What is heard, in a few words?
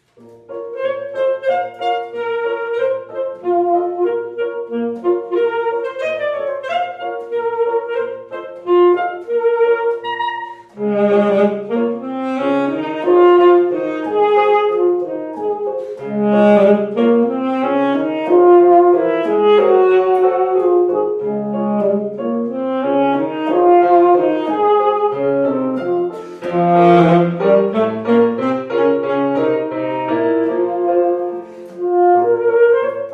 Solo Only, Alto Sax; Solo Accompaniments; Album Leaf; Grieg